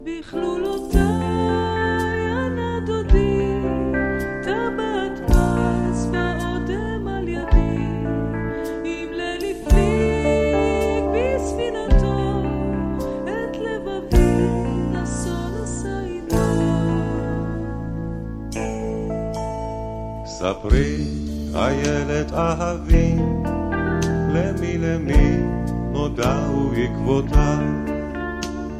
Romantic

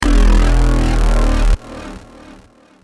Dubstep

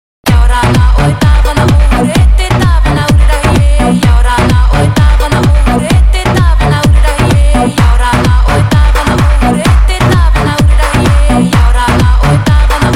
Drum

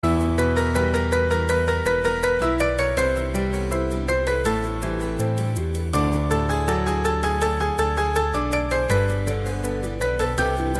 Piano Sms

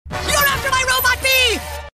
Robot Bee; Zim

